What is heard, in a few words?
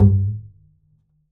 perc, pizz; meatbass